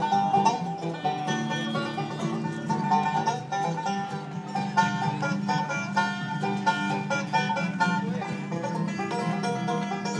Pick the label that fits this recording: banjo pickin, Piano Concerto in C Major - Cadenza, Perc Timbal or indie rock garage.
banjo pickin